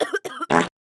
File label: fart coverup